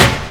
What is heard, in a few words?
hit, PERC; Techno Trance; DRUMS; Techno Trance Essentials